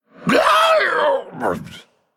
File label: Male Undead Death